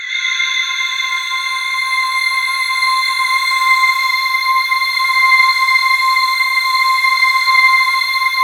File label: JV feedback, JV-1080; Syntec; Wall of Sounds